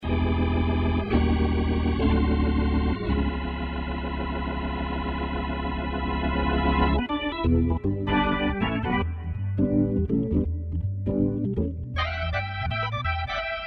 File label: keyb organ